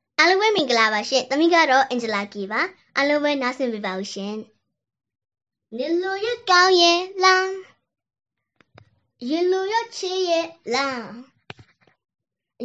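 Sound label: f-kid Burmese Singing Phone Rec, female kid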